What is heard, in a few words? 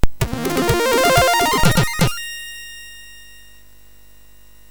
Bomberman C64, MOS6581R4